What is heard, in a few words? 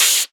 Open HH, Cymbals